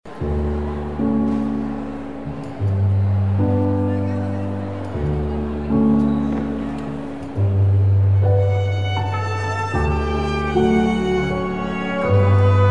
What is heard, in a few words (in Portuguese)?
Piano e Cordas; Gyminopedia